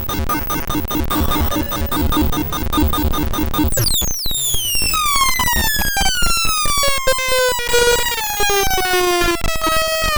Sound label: SAA1099P variable clock